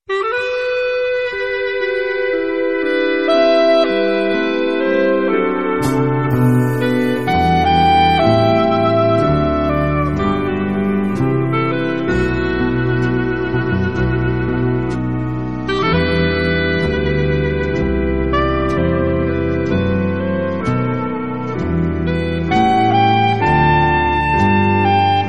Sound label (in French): corde